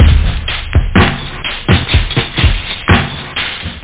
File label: breakbeat; Rhythm